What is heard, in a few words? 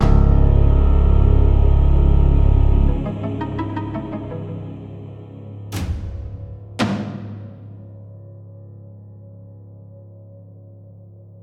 Caves Formation